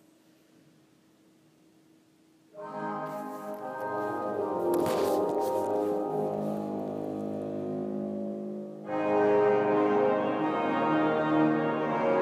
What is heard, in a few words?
vox humana organ in martinikerk groningen